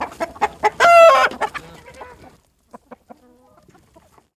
chicken long; Ed Edd n Eddy; Adromulus Pack; EEnE

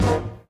Band Hit; Ed Edd n Eddy; Adromulus Pack

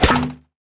Impact; Ed Edd n Eddy; Adromulus Pack

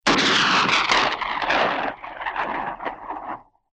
gunshot, weapons

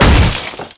impact glass

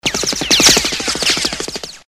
machinegun ricochets, weapons

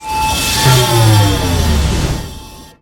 tight suspense

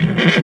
Horse - Whinny; Ed Edd n Eddy; Adromulus Pack

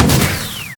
Launch; Ed Edd n Eddy; Adromulus Pack; EEnE

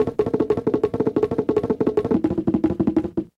bongo run; Bongo Run 1 and 2 together; Ed Edd n Eddy; Adromulus Pack; EEnE

